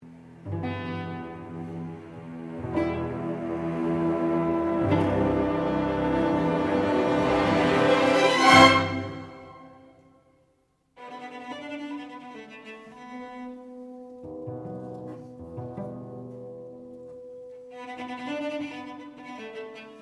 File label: Elgar Cello Concerto 2nd Mvt